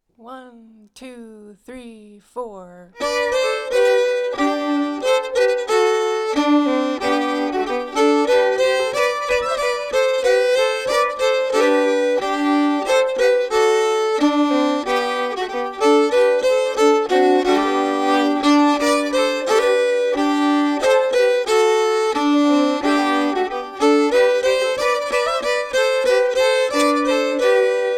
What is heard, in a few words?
slangpolska fran sadermanland melody plus harmony, fiddle